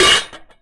BUCKET, Anime Sound FX; COMIC

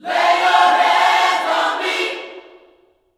LAY HANDS, Vocal Planet Gospel

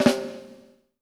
FLAM VERB, PROC SNRS, Drumworks; Sampleheads; New York City Drumworks VOL-1

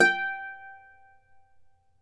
Celtic Harp; E-MU Producer Series Vol. 7 – Old World Instruments

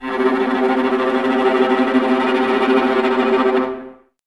Violas Tremolo C 2, String Ensembles; Miroslav Vitous

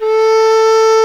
QU DI A4, QI-DI FLUTE; Spectrasonics; Heart of Asia

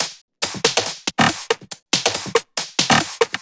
Loops 140 BPM Hihat Percussion Loops

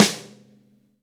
V-Pop Snare, Roland BK-5; Snares

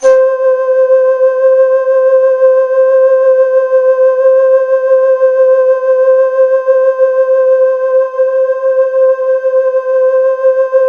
C4, Synth Line

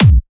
Trance Kicks

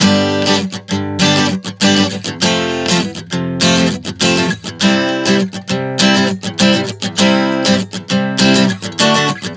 G-F-C 100 bpm, acoustic guitar chord progressions

